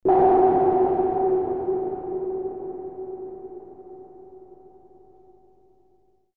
sonar

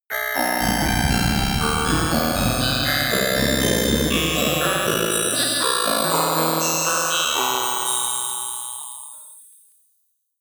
horror orchestra long

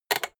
rotary control short quick turn hard

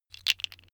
tiles wood

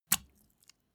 step barefoot wet

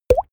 glug

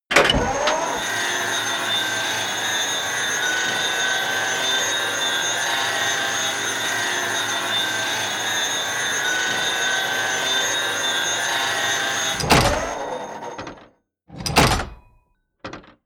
tank large turret rotate

